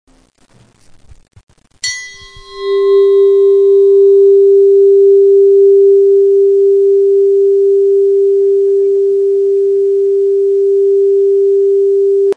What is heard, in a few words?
tubular bells, Ton c